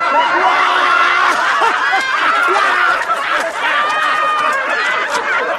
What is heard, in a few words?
NEUROTIC LAUGHTER